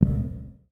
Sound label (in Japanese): 足音; 足音10 1; LcdDem SFX